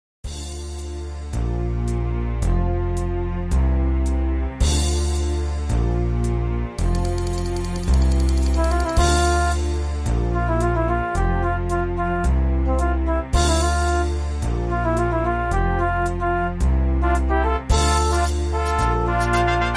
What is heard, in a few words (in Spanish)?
Reggaeton, midi